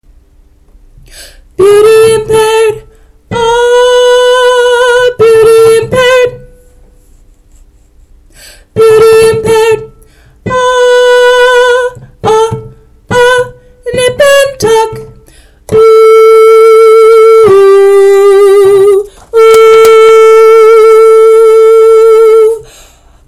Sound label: soprano